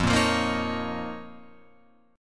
F 7th Suspended 4th Sharp 5th F down Guitar Open F; F7sus4#5; strummed; JGuitar